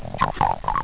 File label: gurble